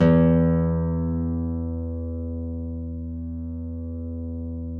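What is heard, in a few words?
nylon, FLAMENCO, Guitars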